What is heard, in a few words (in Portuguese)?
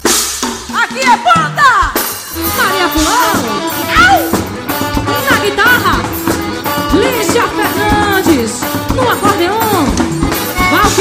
instrumental fim do show ao vivo; show em Lagoa do Carro PE